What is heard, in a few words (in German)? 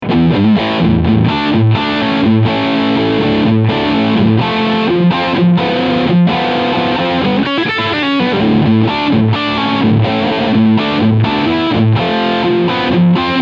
Distortion Steg 80s Style Riff